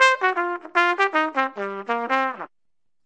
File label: acid jazz trumpet; HORNS SAX TRUMPET BRASS